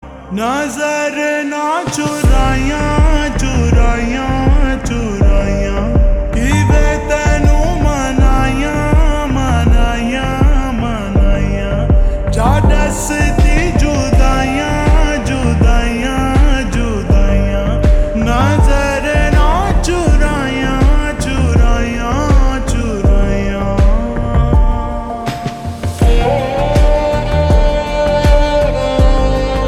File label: Slow Reverb Version Ringtone; call me bae